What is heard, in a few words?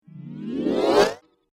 Sci-Fi Riser Swish; whoosh; Whoosh Sound Effects